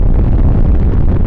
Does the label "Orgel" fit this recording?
No